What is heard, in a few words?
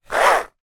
industrial power drill turn by hand